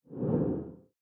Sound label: UI Teleport; NorsSound; Teleport Soltar